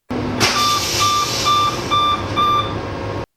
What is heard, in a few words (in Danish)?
s-tog 3g open